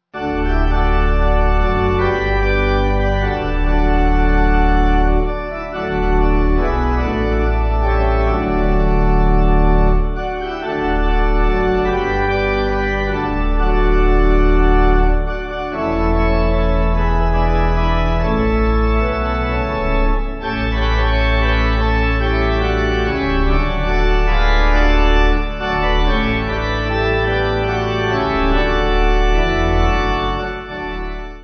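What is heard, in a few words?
Theres A Garden Where, Pipe Organ; Fillmore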